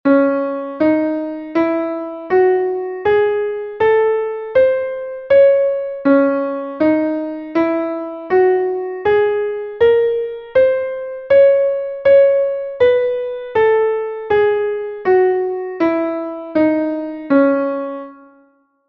scale of c sharp harmonic; Essentials of Music Theory: Elementary

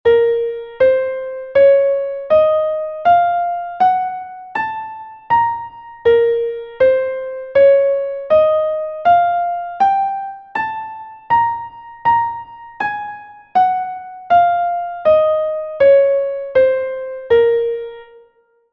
scale of a sharp harmonic; Essentials of Music Theory: Elementary

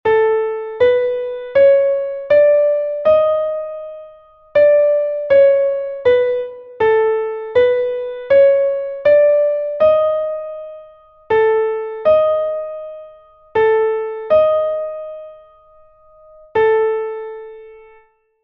augmented fourth; Essentials of Music Theory: Elementary

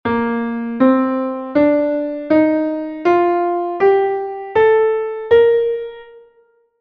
scale of b flat; Essentials of Music Theory: Elementary

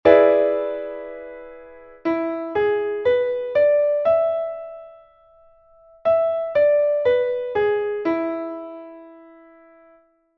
dominant seventh; Essentials of Music Theory: Elementary

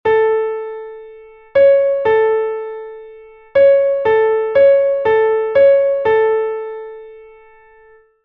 major third; Essentials of Music Theory: Elementary

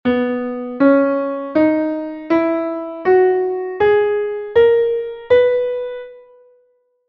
scale of c flat; Essentials of Music Theory: Elementary

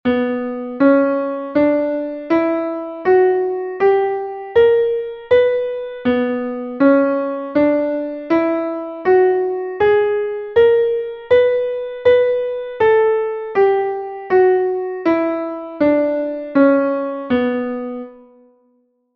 scale of b harmonic; Essentials of Music Theory: Elementary

